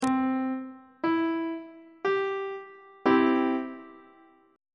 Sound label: d double flat major chord on treble clef